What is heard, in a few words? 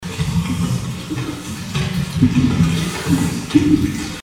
atmo